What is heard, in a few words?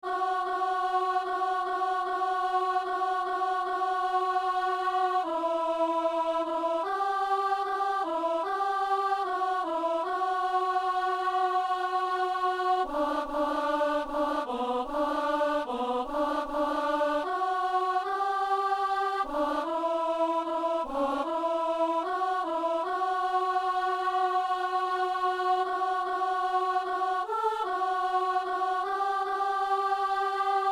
alto